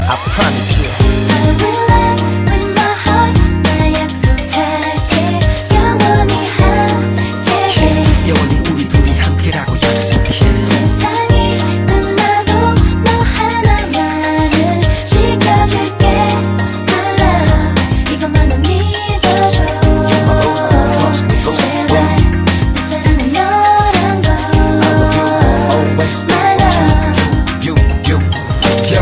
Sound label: Korean Song